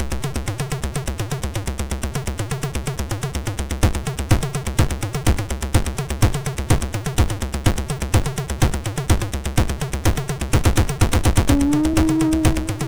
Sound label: MOS6581; MOS6581R4